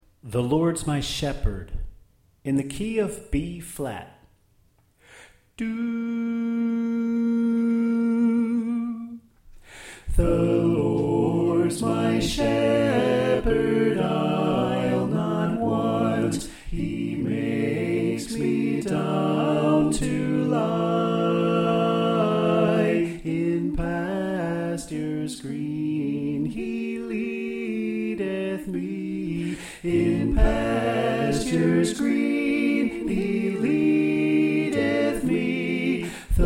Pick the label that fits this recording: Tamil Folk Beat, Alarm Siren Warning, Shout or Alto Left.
Alto Left